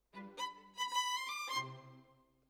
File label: Violin Solo